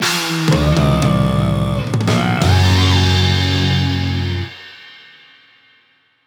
Battle Defeat (Jingle)

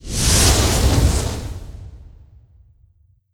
Column Fire; Skell Magus

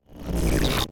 Purple Battery Plate Light On; Sx Purple Battery Plate Light-On Rev

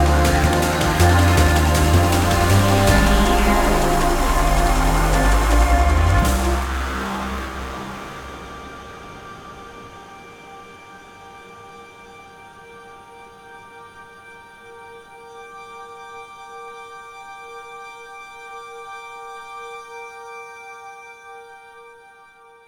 Fight 23 Bars 160 Bpm

